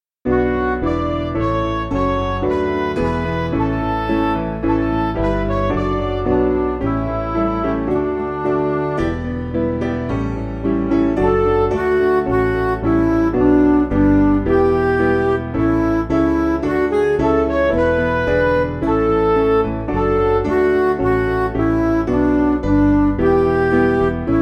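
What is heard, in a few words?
Music Backing Files for Churches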